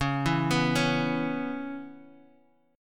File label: C sharp Minor 7th; x,x,x,6,4,6,3,x